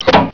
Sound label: chunks; valve; coil